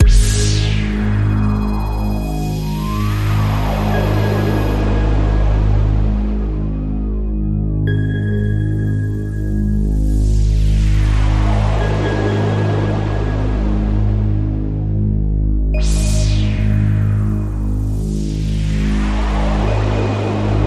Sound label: Radio Toolkit Pad